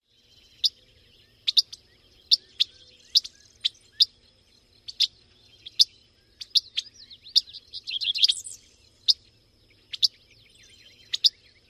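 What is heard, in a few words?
Dickcissel chick calls of pair nest alarm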